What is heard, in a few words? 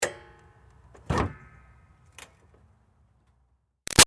Car Highlander Hood Pop Open; 2005 Toyota Highlander V6; Cars; Vehicles; Big Room Sound